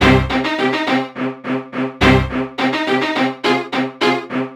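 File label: 105 Hip Hop Strings C major; Pro Tools Essential Loops; Digidesign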